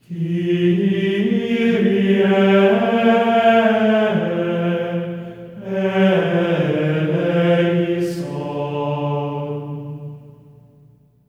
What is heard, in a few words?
KYRIE G4, voices; Spectrasonics; Symphony of Voices; GR KYRIE